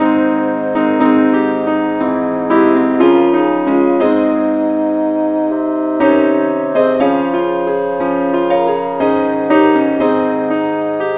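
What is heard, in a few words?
keyboard key D flat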